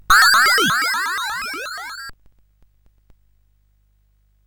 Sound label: War Cars Construction Kit, CSG8580R5